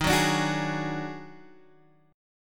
D sharp Minor Major 7th Double Flat 5th D sharp, down, Guitar, Standard; strummed; chord